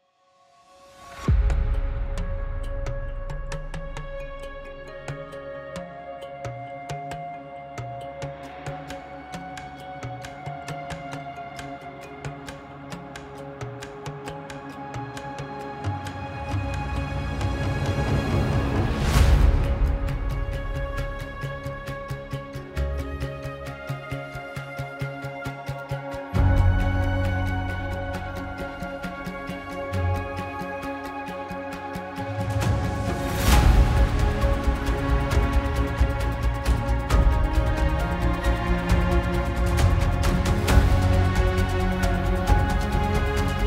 Trailer Music; Microsoft Flight Simulator; Xbox Series X|S

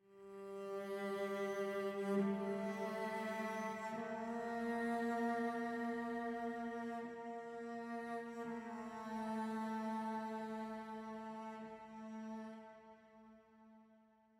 Celli 58bpm 4-4

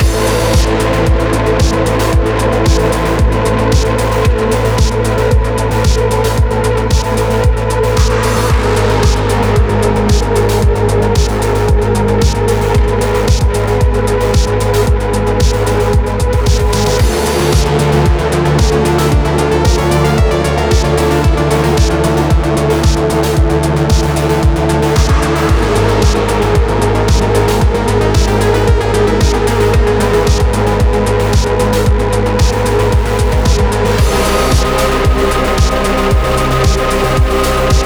Level 1 Boss

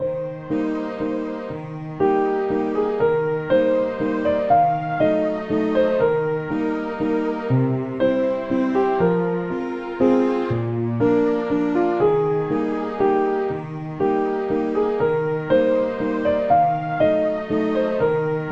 Waltz; superola champion edition

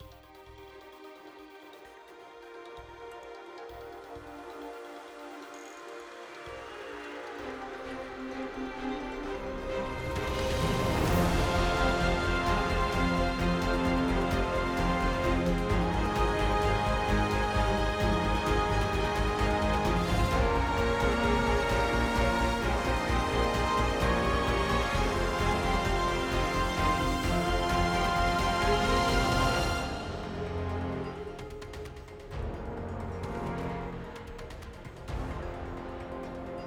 BGM Ingame Battle Start

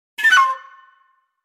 Surprise Dialogue Box (Dizi); Black Bird

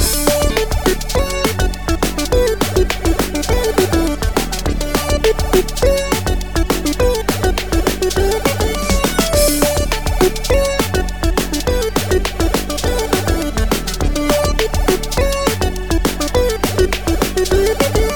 Menu Loop; Chiptune Champion; windows gamerip 2016

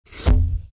Enter Box; Fancy Pants World 1 Remix

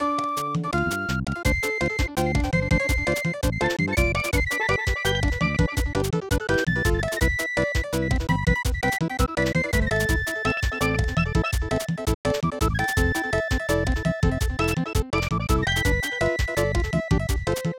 Item Shop; nekotako; snes

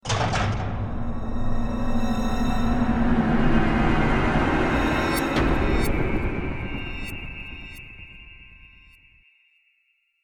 Stinger Decrease Action; Naughty Bear: Panic in Paradise; ps3; xbox 360

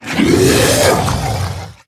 Zombie Monster Die; Undead Collection

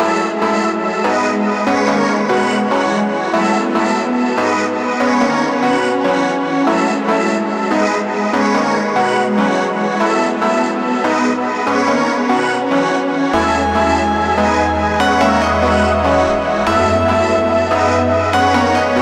MUSIC STORY TRIUMPH

